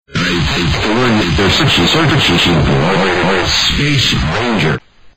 win; freak fortress 2

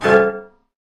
scare; itsteals; legs; slender

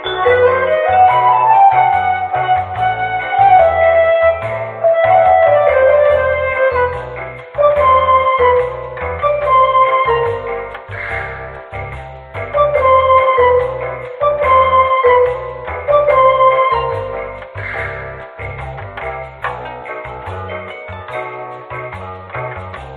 upgrade music; tf2ware ultimate

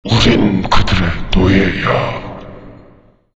zombi coming

